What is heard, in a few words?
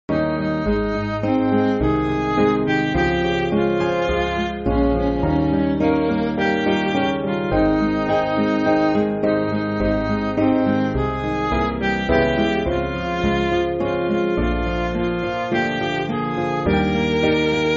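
Midi, Backing Files for Churches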